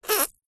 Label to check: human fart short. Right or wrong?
Right